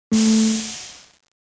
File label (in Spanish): puerta